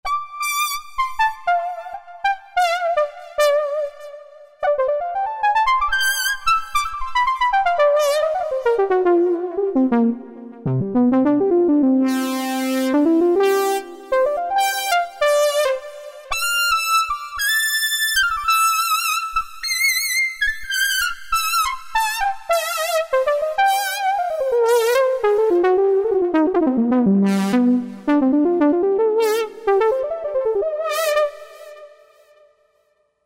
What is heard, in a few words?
Roland JD-990, Fusion Solo; Factory Patches